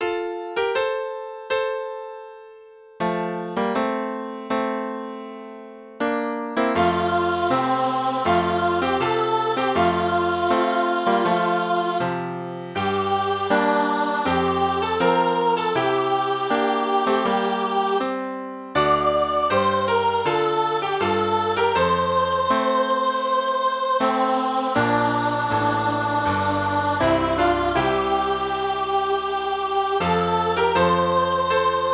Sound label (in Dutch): Lustrumheildronk